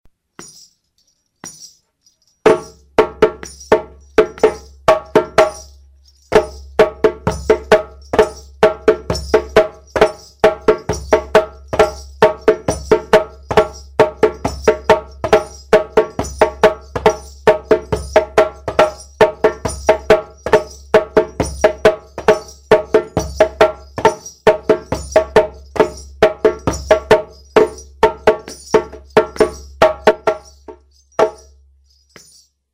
Kuku, djembe

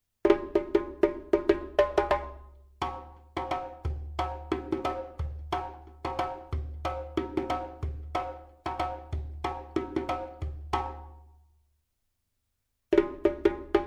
Fankani, djembe

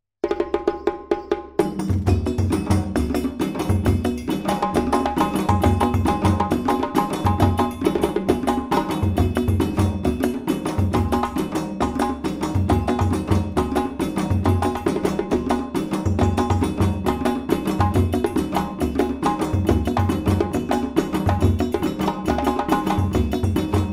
Kakilambe Volledig, djembe

